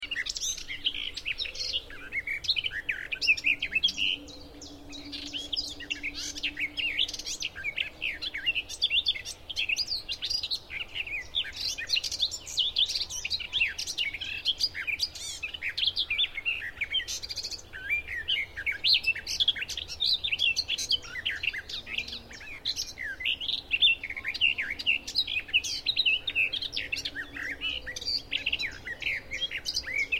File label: Garden warbler; possibly finmere